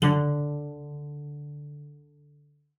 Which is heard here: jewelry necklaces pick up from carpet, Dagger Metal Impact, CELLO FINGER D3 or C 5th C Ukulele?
CELLO FINGER D3